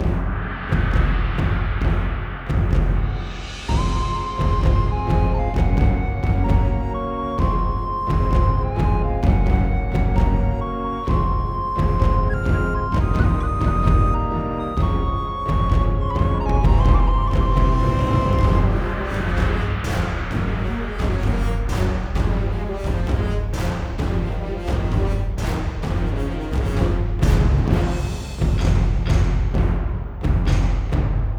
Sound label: login loop